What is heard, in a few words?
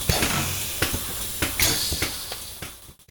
disposal flush